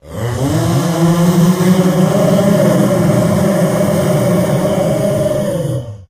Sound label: controller presence l